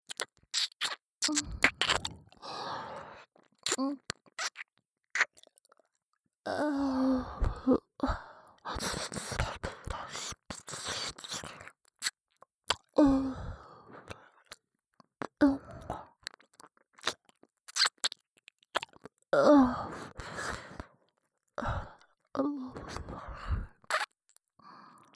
左耳キス舐めループ

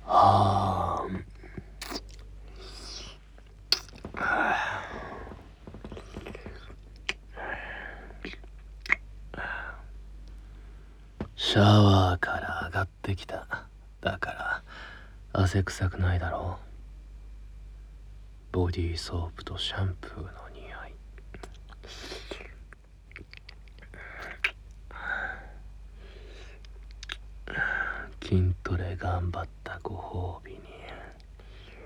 耳舐めと乳首責め; 筋トレ＆シャワー終わりに耳舐めと乳首責めしてくる直虎2

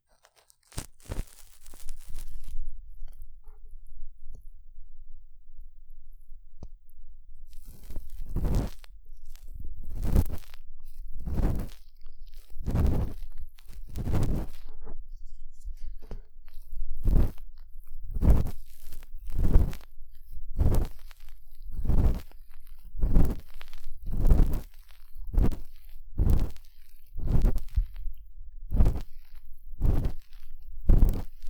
良音 オンリー 声なし 耳かき; YouTube毎日耳かき配信

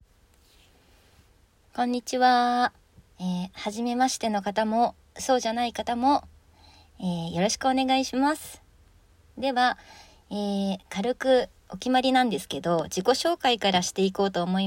大食いパート, 咀嚼音有り; スカトロ

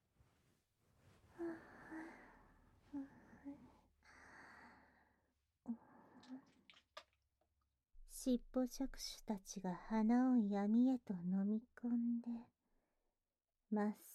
耳ペロ 授乳 添い寝; 溺愛拘束

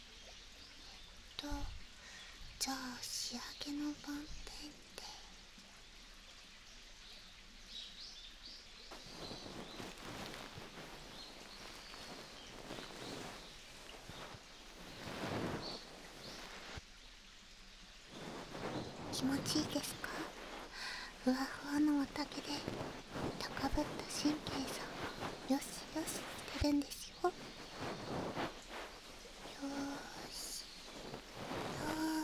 【右】梵天(終), 耳かき, ASMR

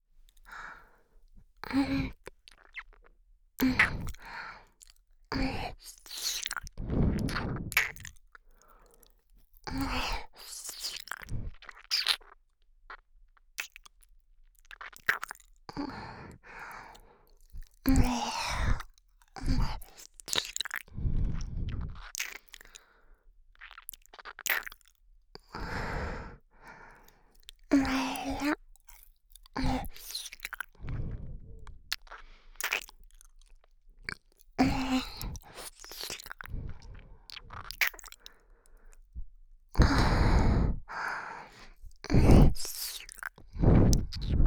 スキスキ耳舐め「右」

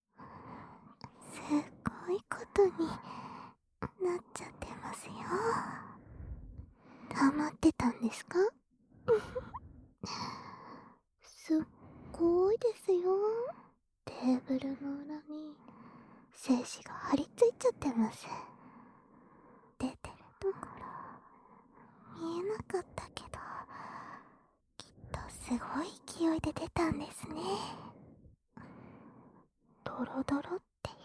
ローション付きおしぼりでお掃除; お耳密着型☆コンカフェ『みみなめぇ～る』へようこそ♪

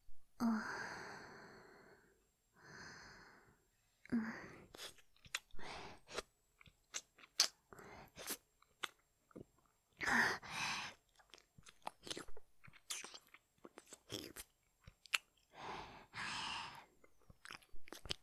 キス; 【マゾハーレム】

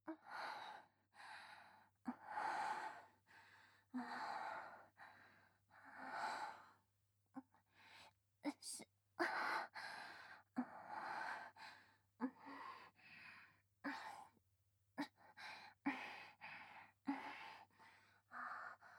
背中越し 喘ぎ声; ミズキ

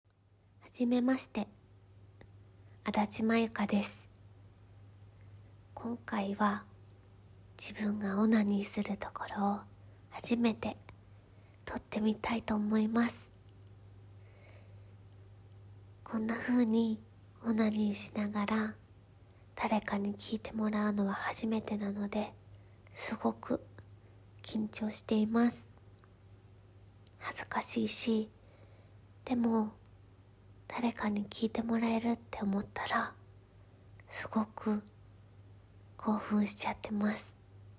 初 ガチ 実演 オナニー; THE FIRST ORGASM

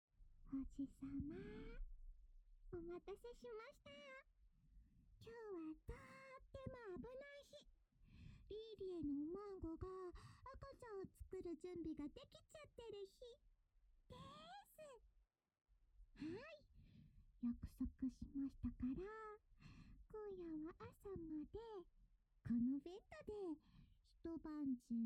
壁越し ver; 危険日に子作り交尾; エルフママ・リーリエちゃん

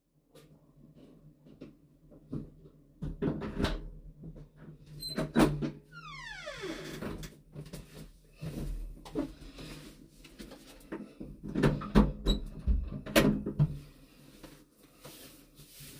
おトイレ おしっこです

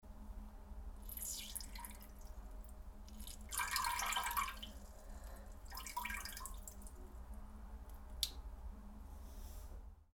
おしっこ ちょろろちょろろろちょろろろ, トイレ盗聴 排泄音; 排泄音集

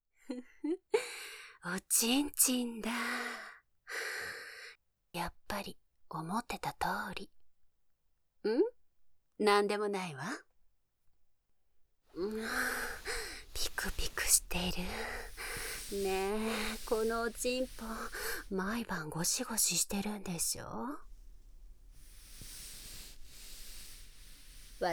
フェラ＆キスシーン 効果音入り; 実践!有機肥料の作り方 熟女指導員によるおほ声講習

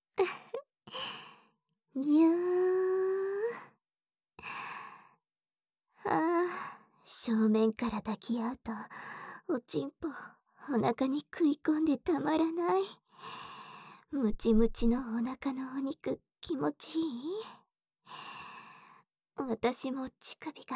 おほ声フルコース, ASMR

